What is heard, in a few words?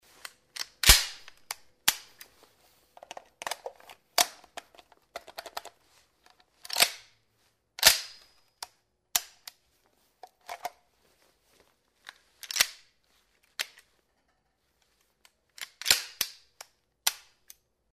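preparing pistol for shooting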